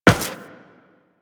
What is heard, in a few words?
Run Metal, Footsteps; Warframe; Tenno